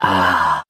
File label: "ah", relief; ooh ahh